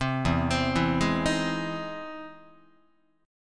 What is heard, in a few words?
E Augmented Major 7th E down Guitar; arpeggiated; E+M7; JGuitar